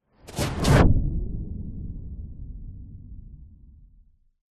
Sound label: fantasy magic spell dark ultra fast pass whoosh